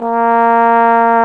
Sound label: BRS BONE, Trombone; Roland L-CDX-03 Disk 2